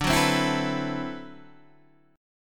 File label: D 13th D, down, Guitar, DADGAD